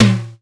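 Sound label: tom snare drum; Best Service; Hyper Dance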